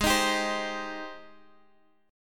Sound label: G sharp Major 7th, Guitar, Open F; strummed; x,x,8,10,10,7; G#M7